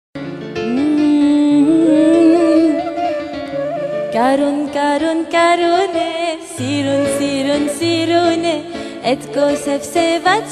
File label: armenian